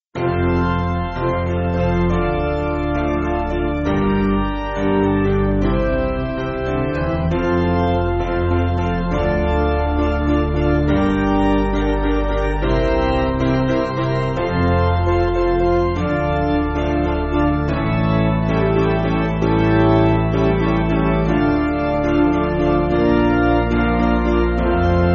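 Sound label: Kyrie, Piano, Backing Files for Churches; 1/F Kyrie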